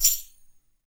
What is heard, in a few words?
TAM, NYC Percussionworks; Sampleheads; 90 s Sample CDs